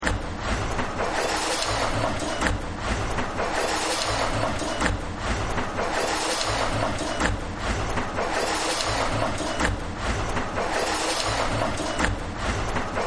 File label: Factory; Factories; Backgrounds